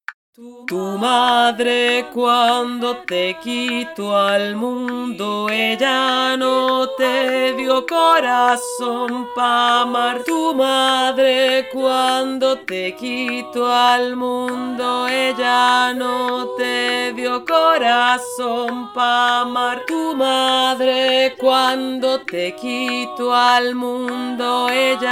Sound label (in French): VOIX GRAVE